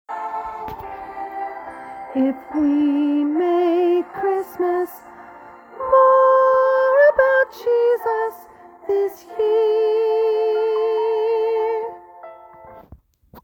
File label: end high harmony, choir